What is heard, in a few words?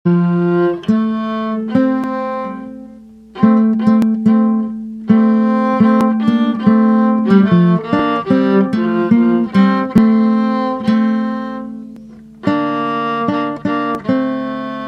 A bow T guitar; Quando ritrovo